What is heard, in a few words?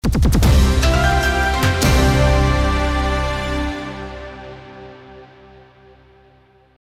NEWS CLOSE